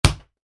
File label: Soccer Ball: Kick Ball; Football kick; Sports; Stuart Duffield